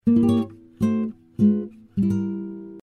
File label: sad guitar